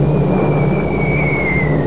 BOMB FIRE

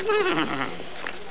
WHINNY

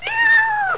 CAT

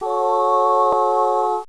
CHORD

